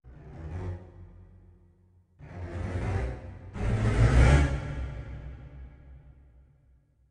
Horror; The Emerald Tablet windows gamerip 2019

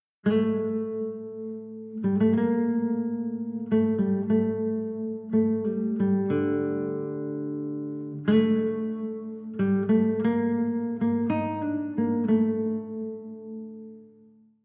Savannah Music; kenshi windows gamerip 2018

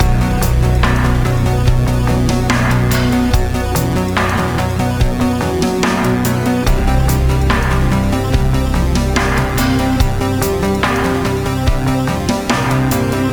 level map music; octogeddon

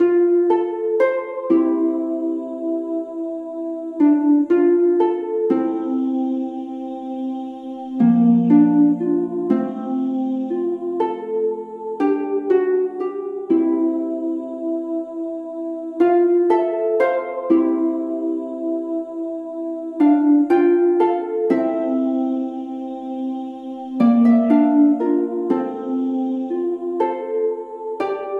bgm comic clear moody; Kaminazo Mirai kara no Omoi de

